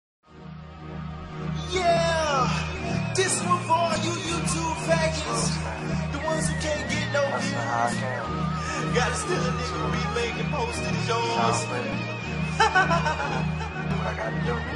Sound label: gross instrumental ringtone